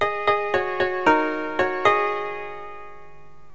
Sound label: jing; ancient